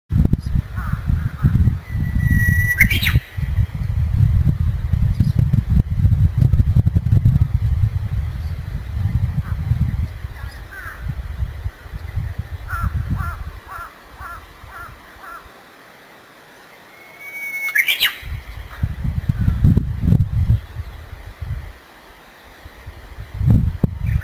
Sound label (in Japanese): uguisu